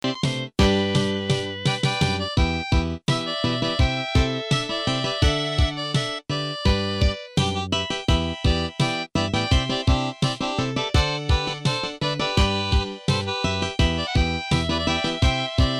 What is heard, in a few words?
2nd harp inst, harp